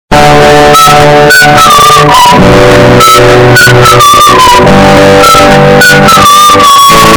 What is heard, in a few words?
background music